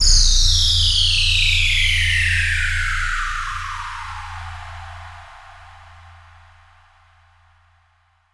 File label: Downlifter